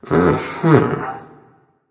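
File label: non verbal thinking, voice; cernunnos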